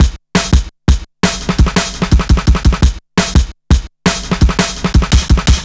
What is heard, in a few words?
170 direct connection, Drum Loops; Drum & Bass; Total Drum&Bass